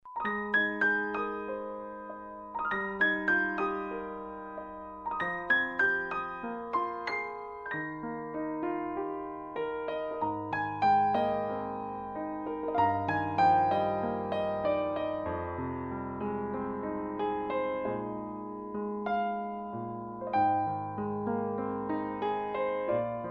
artless piano

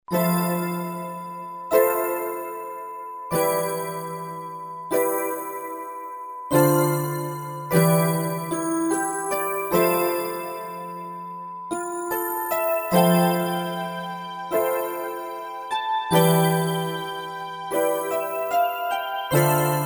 MUSIC BOX

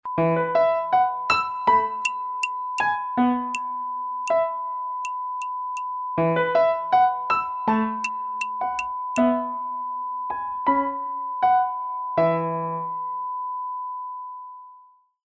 air groove

